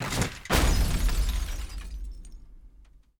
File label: chandelier fall and perish